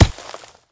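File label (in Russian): выстрел в мешок с песком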